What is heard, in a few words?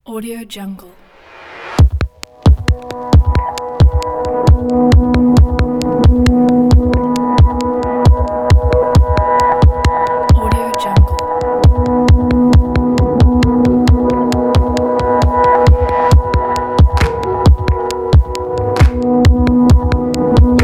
abstract minimal